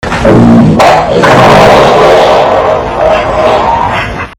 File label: fart